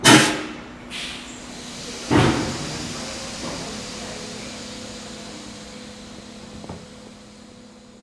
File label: train pressure down; best service Blue Box